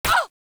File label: Voice Player Hit; Warframe; Caliber Chicks Minigame